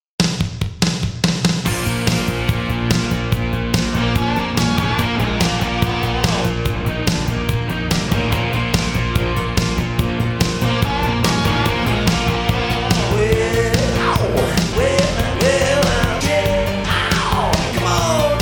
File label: Grunts